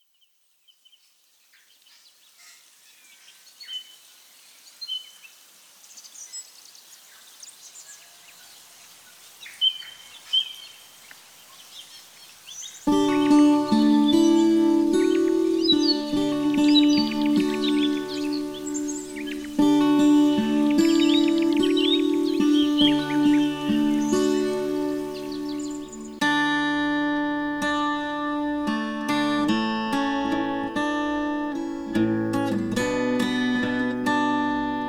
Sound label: bass guitar